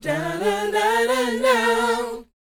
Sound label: BOYZ, R&B Dance; Spectrasonics Vocal Planet